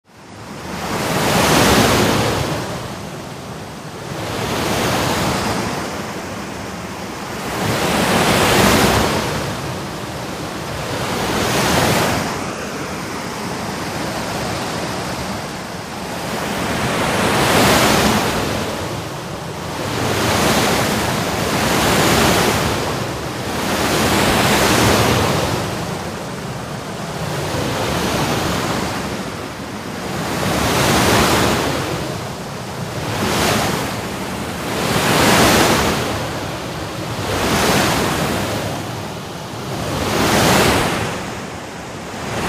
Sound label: Panned Waterfalls Simulating Rapids